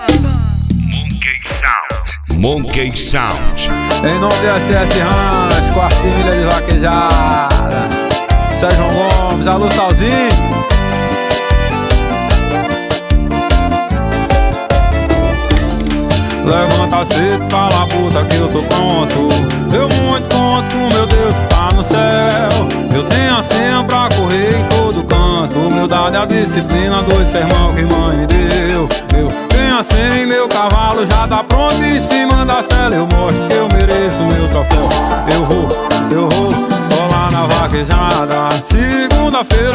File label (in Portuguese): esp balada